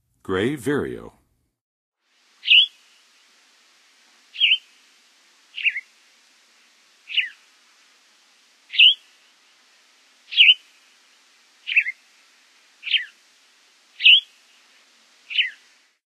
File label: Gray Vireo, Songs of Birds of North America; Western USA; Western USA Songbirds